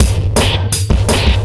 Beat, Futurist Drum & Bass; Drums & Percussion

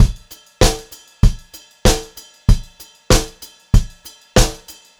VARI, Pop-Rock Drumloops; USB Soundscan vol.37; AKAI

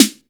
HOUSE DRUM SD; AKAI S6000 CD-ROM - Volume 1; DRUM RYTHM

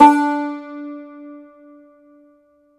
cumbus saz D, plucked strings; E-MU Producer Series Vol. 7 – Old World Instruments

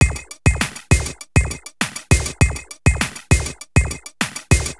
Beat, Industrial

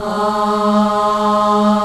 MIXED 4, ORCHESTRA; Roland - LA Composer vol. 1

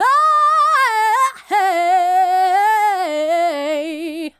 Female Solo, Hip-Hop and RnB Vocals

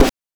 GHOST SD; NES DPCM; MIDINES

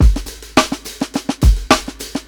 16TH BEAT, breaks, drums; Basement drums vol 2

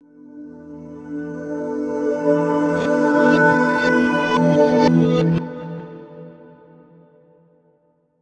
Guitar FX, Stringed Instruments, World Pop; Sonic Foundry (Sony Creative Software)

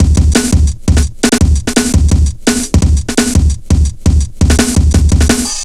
170, Drum Loops, Drum & Bass

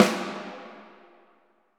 SNR, Orchestral Perc; Roland LCDP03

